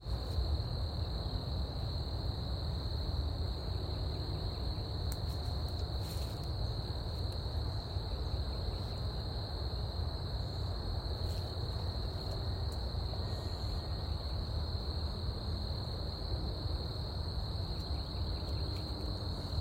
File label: crickets crows bea eating leaves; field recordings; scout island